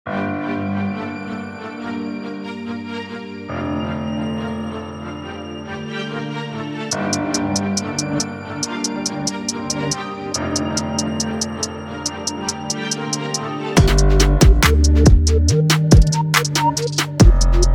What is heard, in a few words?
Trap Rap